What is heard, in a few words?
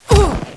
fall hit, player male